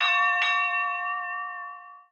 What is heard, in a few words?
Buoy